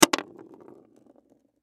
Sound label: Chap Stick Toss Table Roll; Rolling Sound Effects; Blastwave; Foley